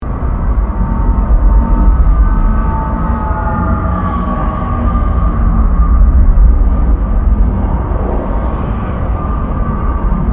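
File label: Ambience City Office Pit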